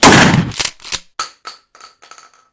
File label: BEKAS12M